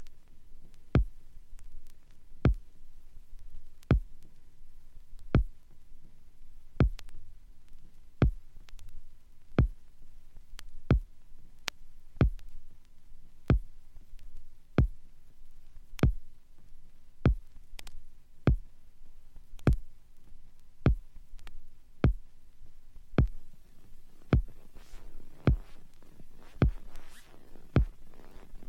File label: Geiger Counter